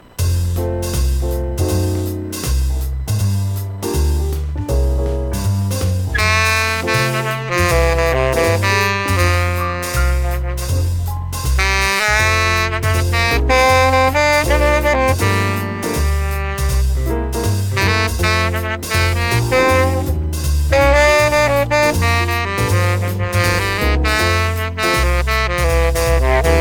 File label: tenorsax